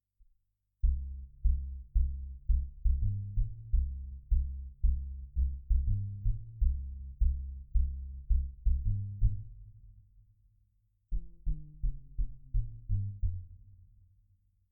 farfisa string orchestra bass

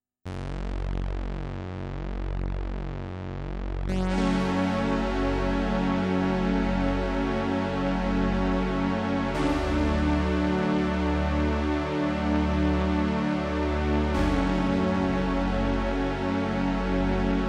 roland jupiter 8

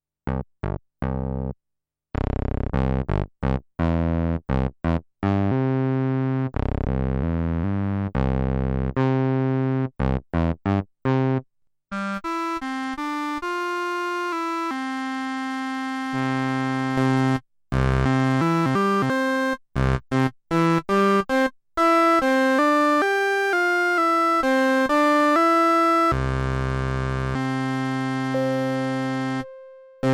korg sigma

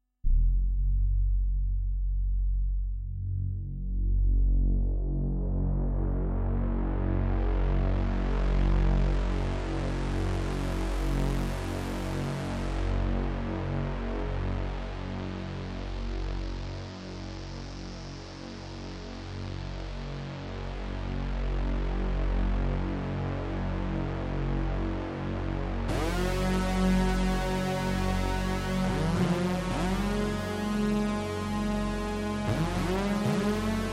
roland jupiter 8

